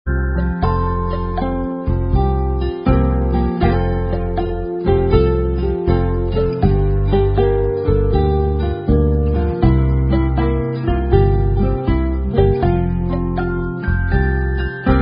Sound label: Karaoke Songs; Girl Scouts